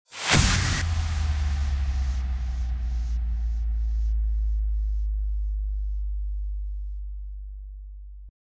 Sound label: Impact